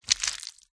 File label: cartoon wet squelch sloppy movement